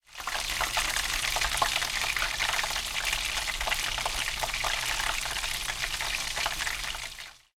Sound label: deepfry; anemoi